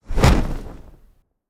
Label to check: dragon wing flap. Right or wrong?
Right